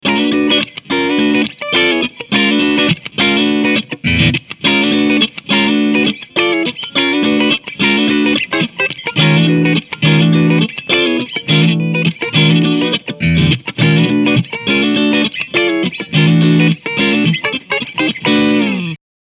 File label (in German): Funk Verstärker